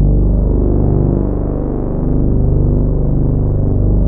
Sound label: Prophet 5, G1; Hollywood Edge - Giorgio Moroder Rare Synthesizer Collection